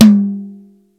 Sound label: TOM; Crypton - DrumBase5000; DRY KITS